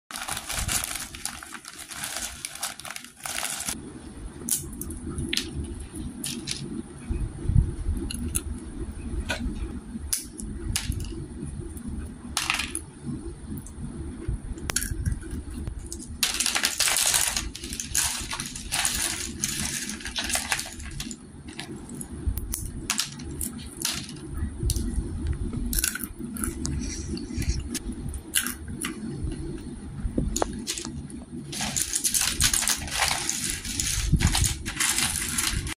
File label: Open pistachios